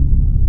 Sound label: GREY PAD; Q Up Arts; Dream Experience; DANCE